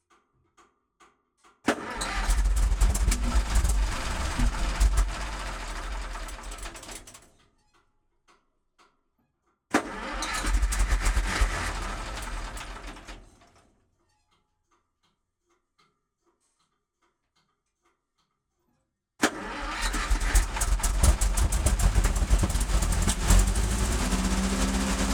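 sherman m4a3 onboard start attempt medium drive decelerate slow stop off engine; Sherman M4A3 Medium Tank; DPA4061; Pole Position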